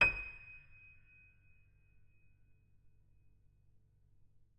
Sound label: D sharp 3, piano